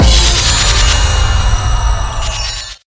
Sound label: full screen win; Amatic; Wild Respin